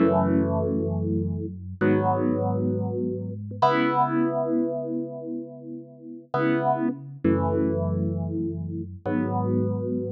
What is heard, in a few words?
Steinway Piano R&B Rhodes 265 bpm